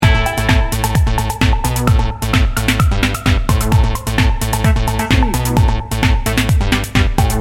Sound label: electro energy loop